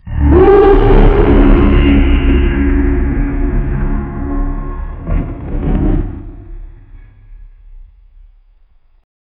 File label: thunderous roar demonic; abyss